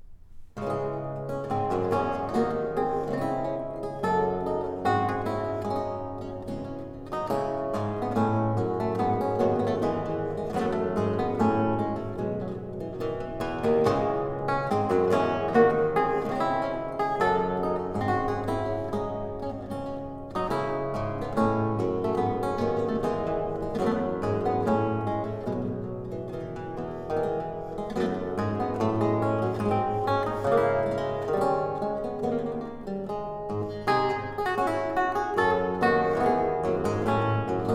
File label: Gavotte, Lute Music